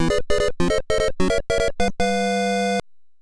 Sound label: Hurry up fanfare